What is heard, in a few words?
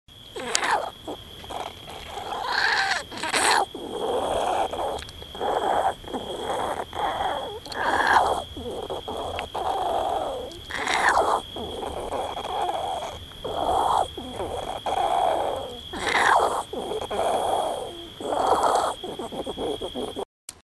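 sound of a hedgehog in a night forest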